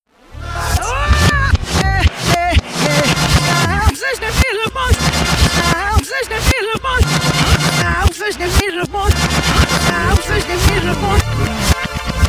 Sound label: song reverse